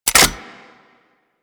Reload, Weapons; Warframe; Boar

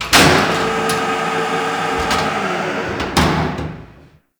Cave Hanger Catwalk Lower; Warframe; Ambience; Corpus; Crp Cave Hanger

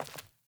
Sandals Walk Default, Footsteps; Characters; Player

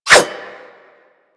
Dagger Whoosh; Warframe; Weapons; Dual Daggers

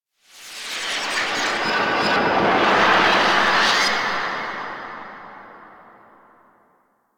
Undercroft Rev Delay Warp Space One Off; Ambience; Warframe; Duviri

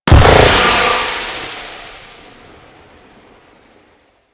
Force Field Hit A; Warframe; Ambience; Tenno

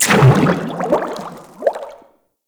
Frogman Burst, Vehicles; Grineer

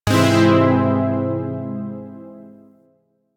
Shawzin Phrygian Chord I; Zariman Shawzin

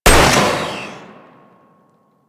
Mech, Weapons; Strun Vandal

